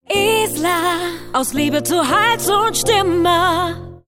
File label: Jingle; ISLA; JFK Spot